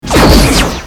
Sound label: quantum torpedoes